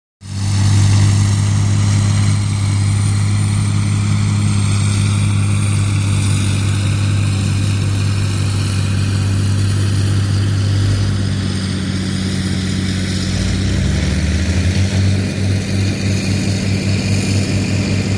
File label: Two Bulldozers At Wor